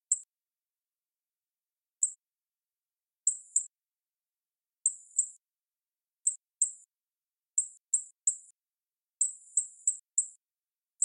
Eleutherodactylus juanariveroi Toa Alta Puerto Rico; call of this species is a very soft chirp, almost beyond the range / of human hearing; West Indian